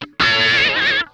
RASP CRAZY, Funk Guitar; 90 s Sample CDs; Zero G

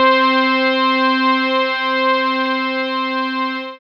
Comby Synth; Best Service Gigapack 1

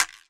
EQ Perc, Percussion Mix

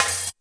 SD, 170 BPM, Drum & Bass; Ueberschall; Drum & Bass Flash Trackz

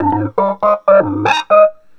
Throatline, Industrial Nasty

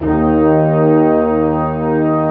brass D3; AKAI S-Series CD-ROM Sound Library VOL-2

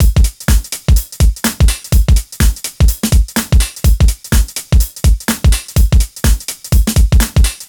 Full Loop 125, Loungin House; Equipped Music

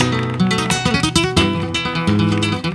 GUIT SOLO; Best Service; World Colours

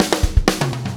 OH BABY

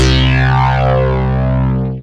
TUBE BASS, mono poly; TECHNO-BWARE

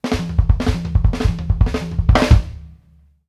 ROUND THE, Drumworks FILLS 84; Sampleheads; New York City Drumworks VOL-1; KD FILLS 84

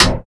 CYBER KIT, DRUMS

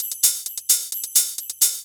hihat 2, 130, drum loops

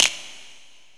SNAP; 90 s Sample CDs; Best Service Dance Mega Drums

